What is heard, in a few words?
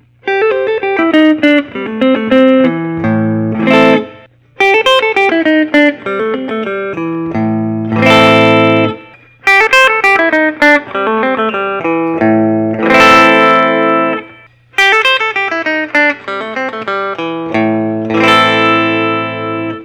prs wood library custom tortoise